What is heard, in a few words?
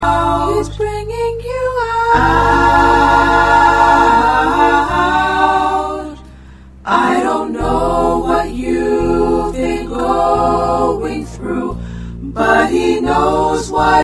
Combined parts